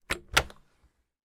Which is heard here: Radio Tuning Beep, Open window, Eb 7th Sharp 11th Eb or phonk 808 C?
Open window